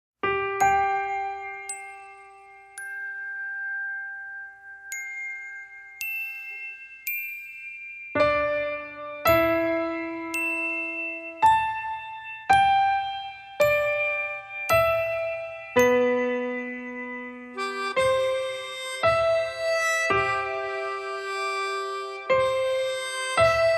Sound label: Top End Stuff No Ukulele